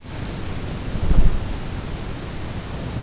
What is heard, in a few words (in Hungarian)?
erdő patkósdenevér 50 cm-re a mikrofontól, 50 Hz; octava50hz; repülés; deneverek; termeszetben